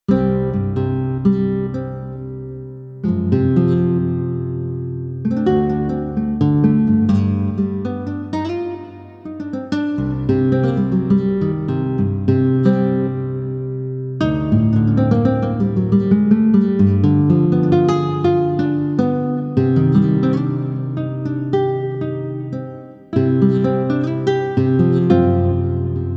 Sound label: guitar nice